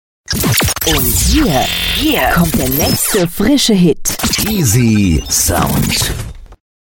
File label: jingle